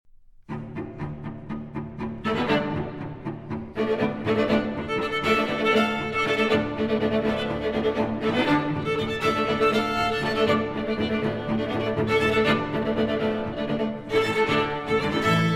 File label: string quartet; walter kaufmann